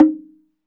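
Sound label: SLITDRM D#4 F, slit drum; SLIT DRM1; Heart of Africa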